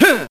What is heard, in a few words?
huh male, Voice